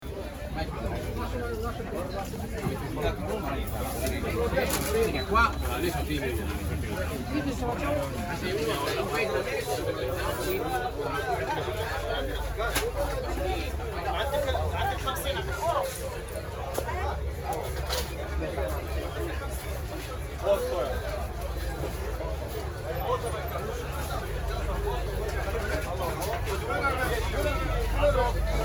rynok

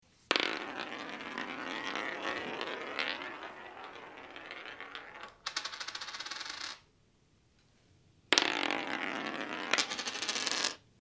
moneta katitsia po polu

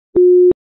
konec zvonka

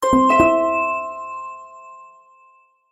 zvuk magii na sms

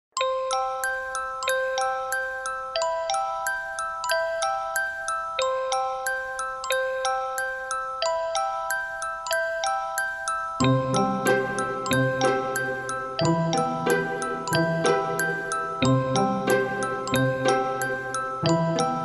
rojdestvenmuz

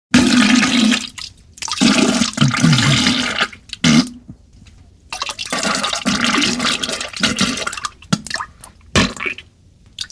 govno

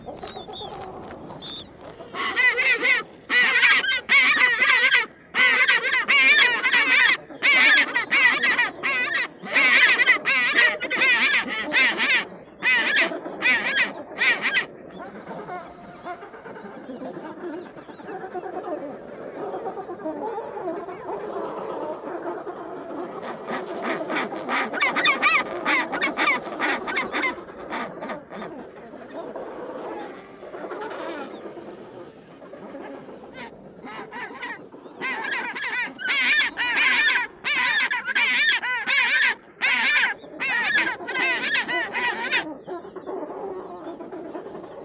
pingvin

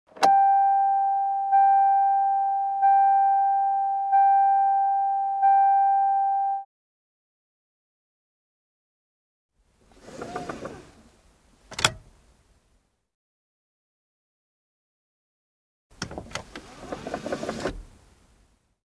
Signal ne pristegnutogo remnia avto

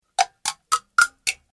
shagi iz mult

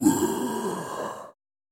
zvuk skeleta

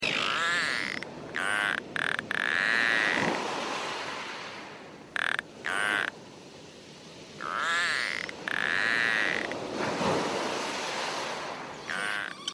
narval, zvuk narvala

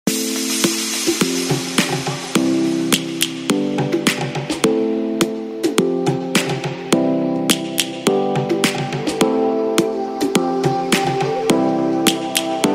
Vlogovaya muzyka

